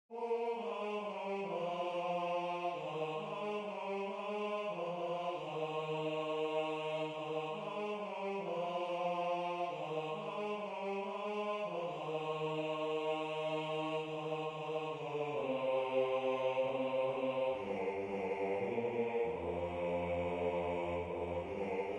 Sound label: Bass